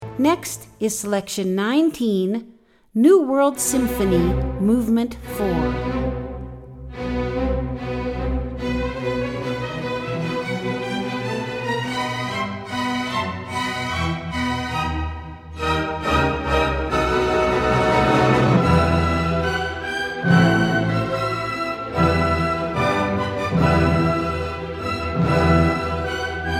new world symphony movement 1, classical

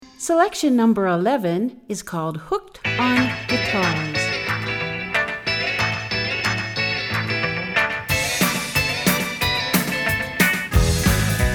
Hooked On Guitars

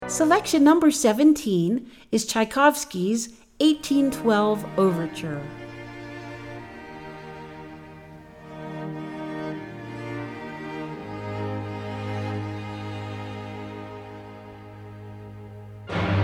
1812 overture, classical

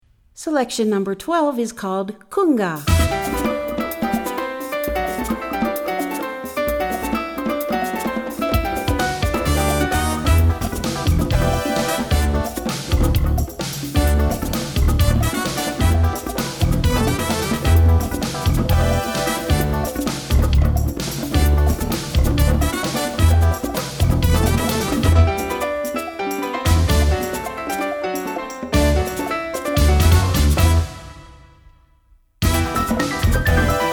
Conga

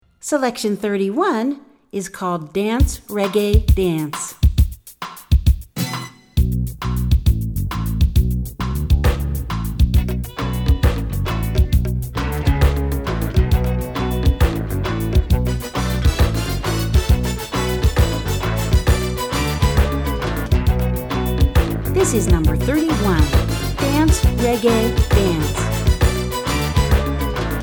Dance Reggae Dance

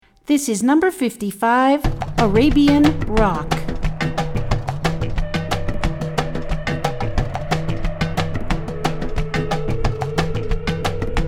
Arabian Rock

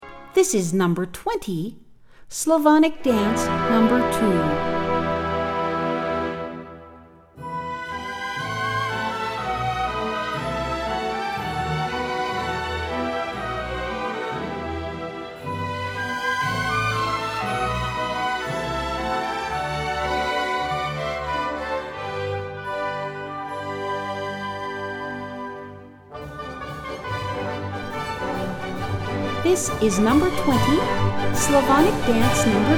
slavonic dance 2, classical

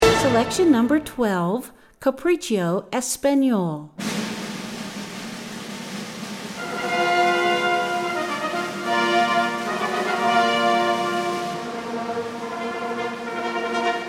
Capriccio Espagnol

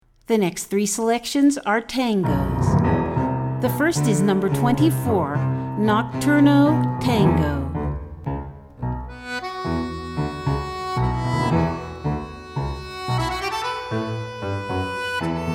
Tango

